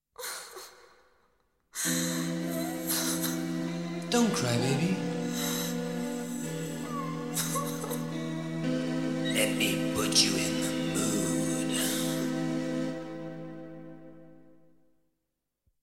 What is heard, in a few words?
Jingle